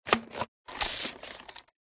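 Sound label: water pistol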